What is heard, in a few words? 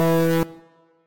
wrong button press; Maze-Lover